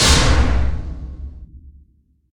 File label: jump pad